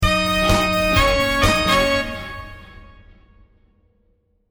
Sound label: jingle; Game Songs